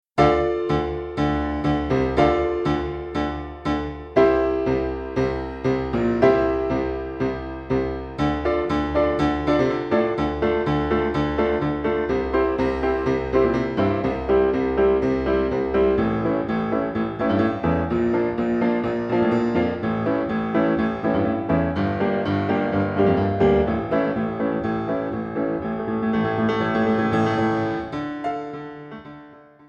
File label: lightning vibration, piano